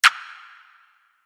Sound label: Laser Clap